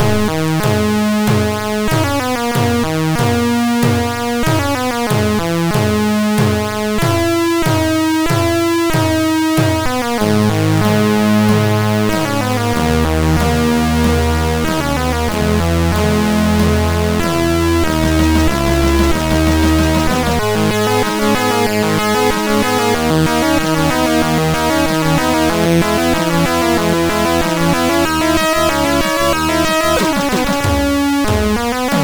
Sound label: Bas Delta, sid, MOS6581R2